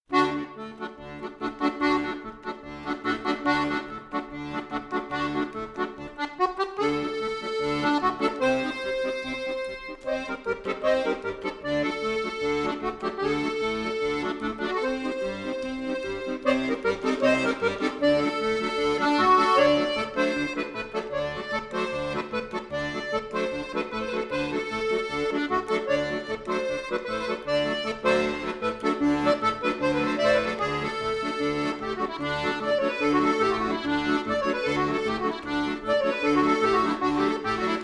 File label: Sirba; Bekkermans